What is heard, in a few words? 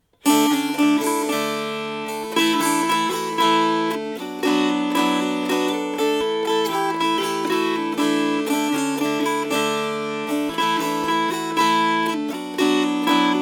Schottisch Strummed